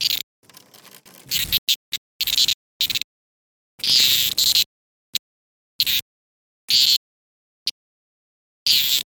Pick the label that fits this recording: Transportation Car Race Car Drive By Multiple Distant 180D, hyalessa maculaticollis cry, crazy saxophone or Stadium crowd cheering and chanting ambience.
hyalessa maculaticollis cry